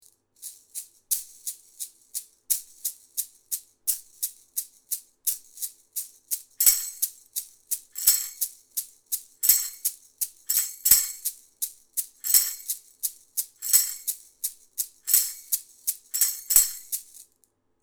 Aufnahme percussion xy niere; telefunken m60

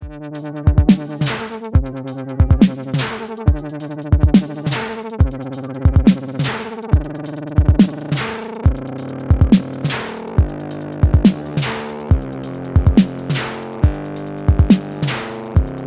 Roland SBX 1 LFO manuell

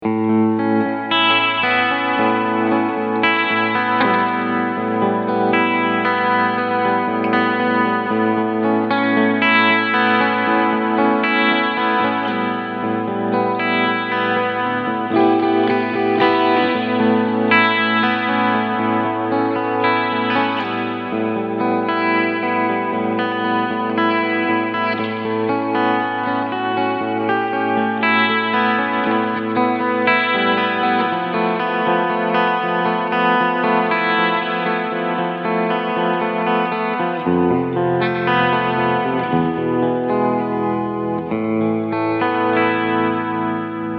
Beides Slow Vibrato

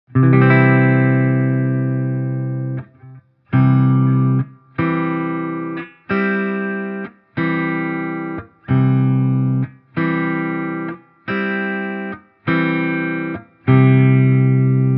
C Dur Umkehrungen offen

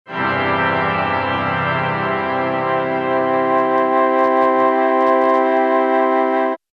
Mellotron M4000D M400 Blende Pipe Organ auf Bass Flute

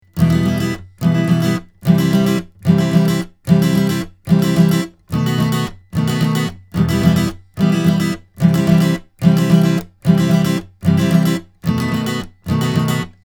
Akustik Gitarre Astin Origin Flat

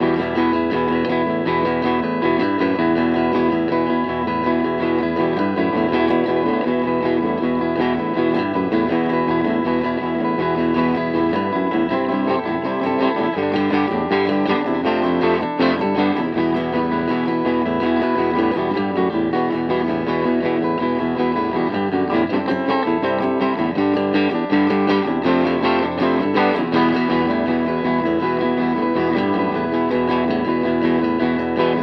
Harley Benton Vergleich HB Clean; Maybach

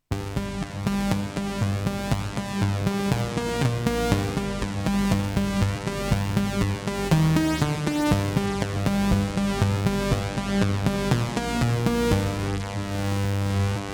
russian filter sequenz kaputt

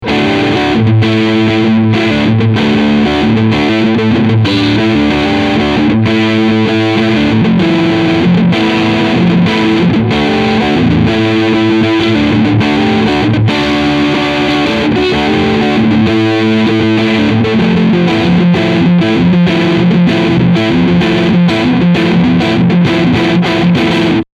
Crunch beide HB

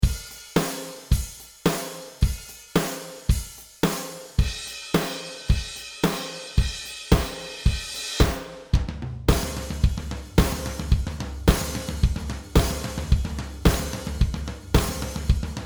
Drumsubgruppe unbearbeitet

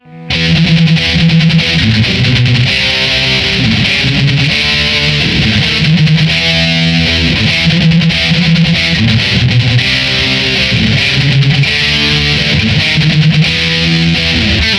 Stegpickup; Petrucci BFR

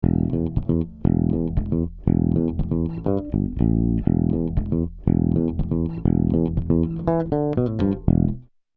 E-Bass Kompression 2 zu 1 SC 130 Hz bis 20 kHz

